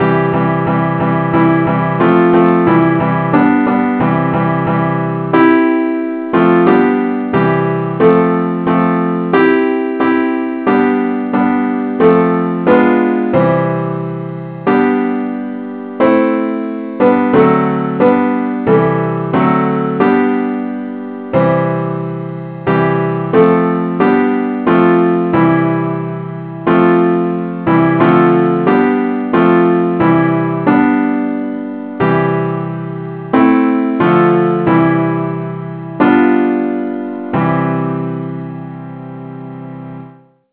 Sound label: simple acc key c; christe Sanctorum